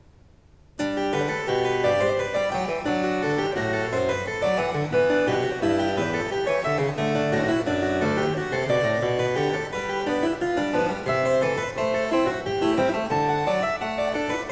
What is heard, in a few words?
Variatio 8 a 2 Clav, Cembalo; BACH; Goldberg Variations